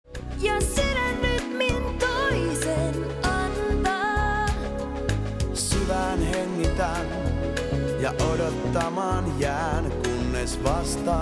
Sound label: pop rest